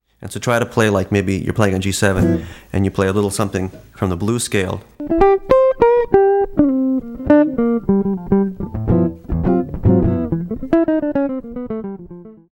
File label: jazzy sounds